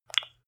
landline click

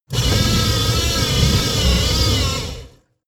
servo large

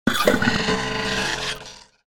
hydraulic door

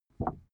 cardboard wobble short medium

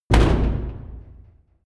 large damper

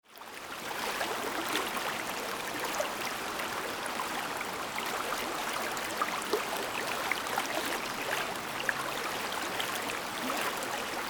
river purling on bank

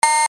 control beep

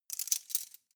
cutlery teaspoons handle

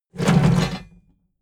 metal scrape big phase

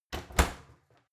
oven close

